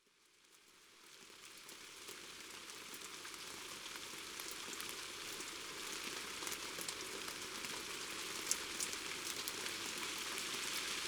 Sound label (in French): La pluie Pranles Ambiances Sonores d Ardeche; Studio Les Trois Becs